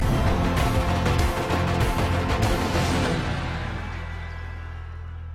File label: new beats; ipl